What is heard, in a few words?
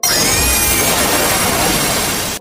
hypercharge sound